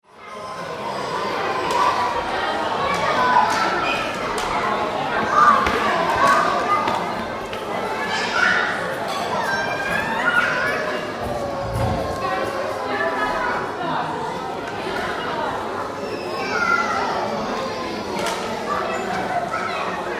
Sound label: Children in Activity Centre 2; Young kids in very large assault course activity game - medium perspective - interior; Playing